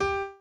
piano chord

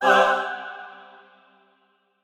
chor

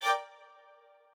strings

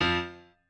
piano

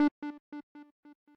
arp

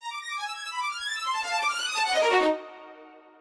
strings

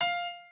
piano

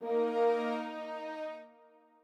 strings

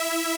lead

